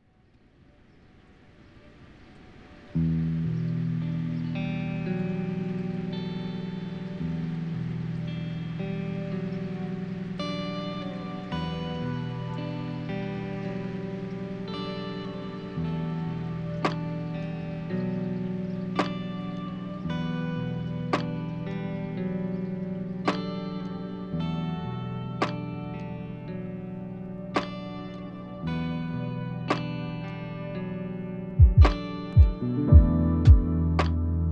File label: lofi hip hop